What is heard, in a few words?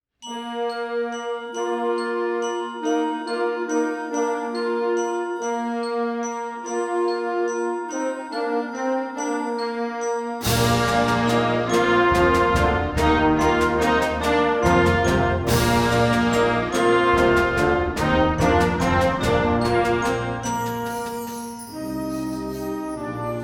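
trident fanfare, concert band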